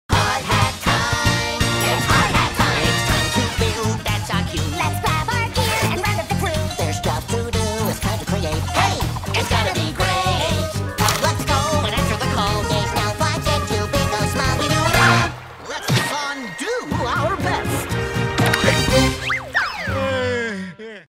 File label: bugs bunny cartoon, موسیقی انیمیشن; باگز بانی